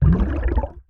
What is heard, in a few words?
underwater breathing